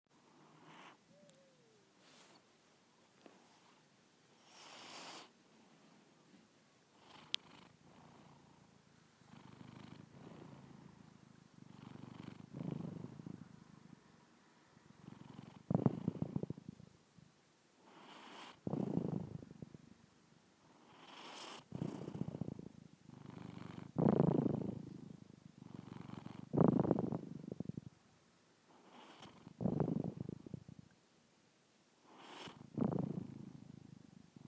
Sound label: snores then purrs the snores